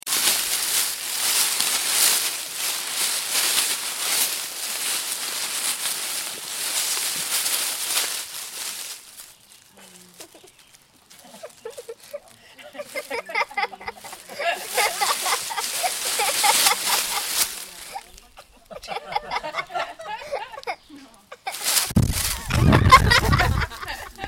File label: AMBIENT SOUND LEAVES GIGGLE; WAVEFARM; ACRA